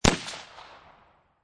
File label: Rifle Marlin 30-30 Single Shot Exterior At 45'; Rifle: Marlin 30-30, Single Shot, Exterior at 45 feet